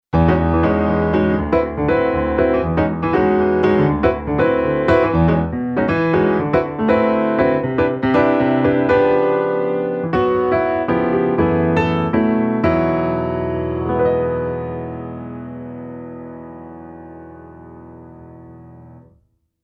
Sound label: Piano WET